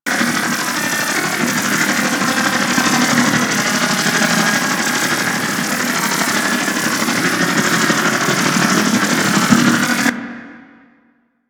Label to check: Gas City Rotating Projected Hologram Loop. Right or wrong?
Right